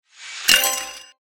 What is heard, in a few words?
unlock